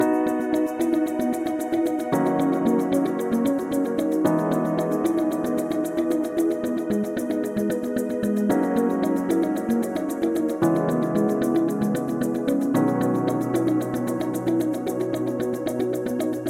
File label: Commercial Video